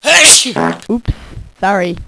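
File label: sneeze fart; Crude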